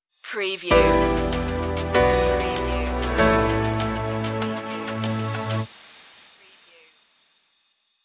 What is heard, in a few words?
petra k stinger